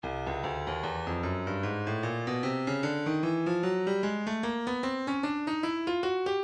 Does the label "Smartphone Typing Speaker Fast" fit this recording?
No